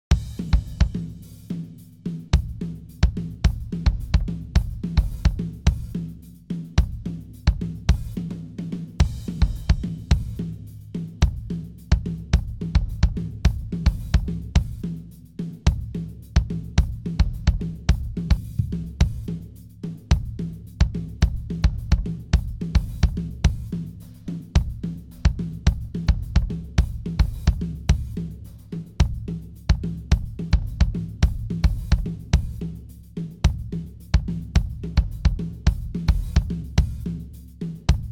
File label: drums